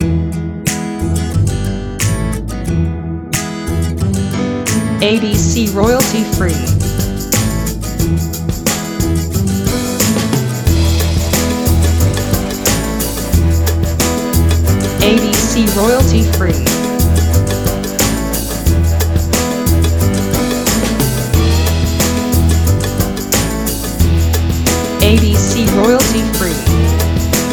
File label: Lazy Crime TV Show Theme